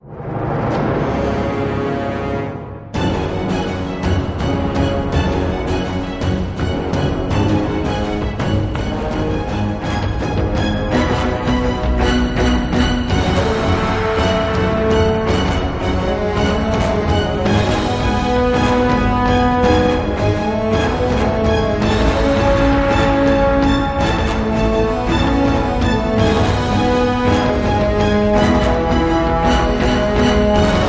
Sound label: No Choir